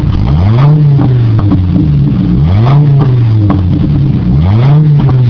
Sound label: hummer h3 split rear